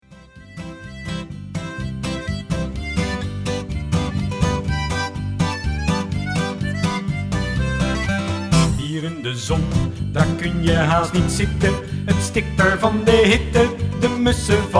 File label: mexico song sample